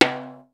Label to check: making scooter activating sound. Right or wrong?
Wrong